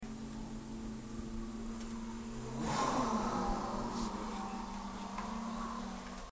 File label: tunnel